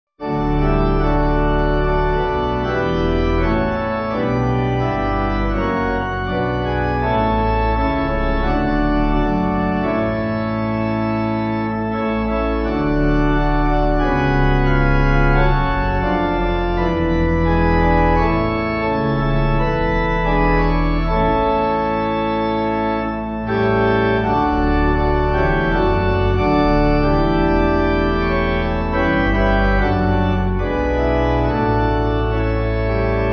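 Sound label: Pipe, Pipe Organ; Thou didst leave Thy throne and Thy kingly crown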